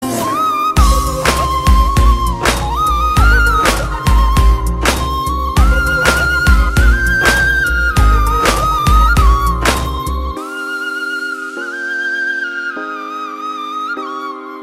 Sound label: Flute Tones